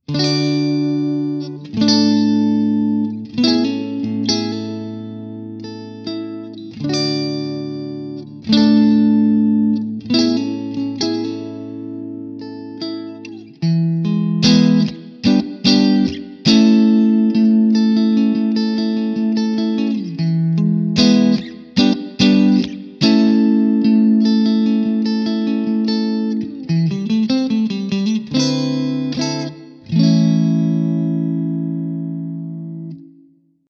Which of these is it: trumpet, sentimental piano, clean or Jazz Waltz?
clean